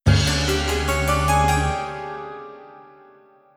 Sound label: Sting; CounterSpy; Android, iOS, PS Vita, PS3, PS4